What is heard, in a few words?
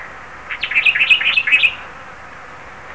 Parus lugubris